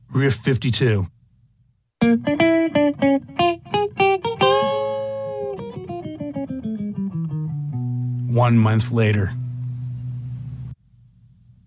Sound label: Riff